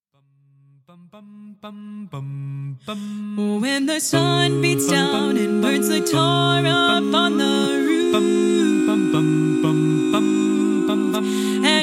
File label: SATB